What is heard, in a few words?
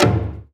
PERC MIX; Spectrasonics; Heart of Africa 1; HAND DRMS